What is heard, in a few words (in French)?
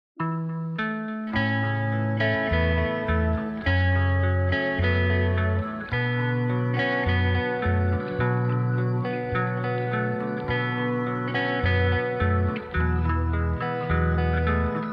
2 voix; J'ai voulu prendre le train; LaM; chorale